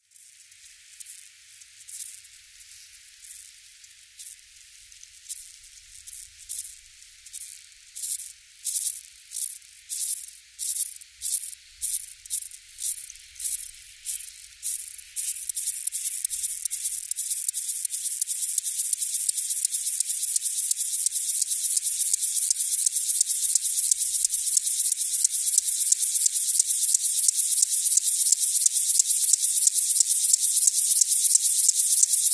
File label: locomotive grasshopper